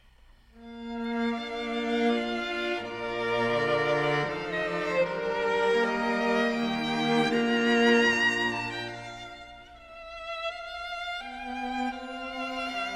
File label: Quintet For Violin, Two Violas, Violoncello and Horn in E-flat Major; Andante; Chamber Works For Winds And Strings